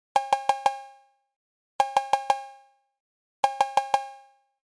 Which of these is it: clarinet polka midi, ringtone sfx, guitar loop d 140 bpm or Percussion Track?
ringtone sfx